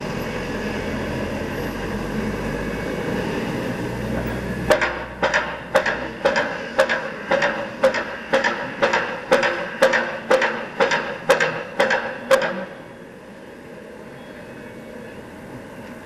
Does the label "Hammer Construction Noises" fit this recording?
Yes